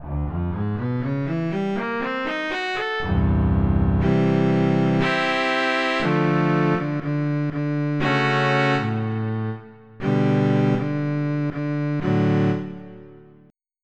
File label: Fiddle; EverQuest